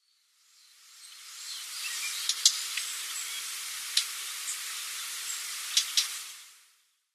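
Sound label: Korean Bush Warbler; chosenuguisu; koe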